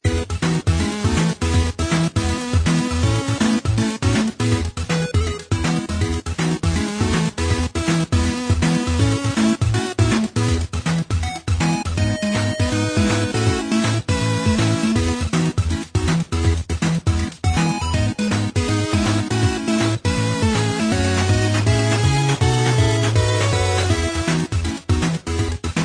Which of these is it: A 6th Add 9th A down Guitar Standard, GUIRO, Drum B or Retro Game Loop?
Retro Game Loop